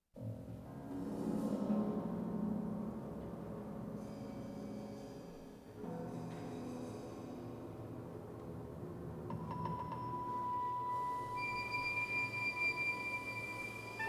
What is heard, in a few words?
Orchestra Variations Orchestra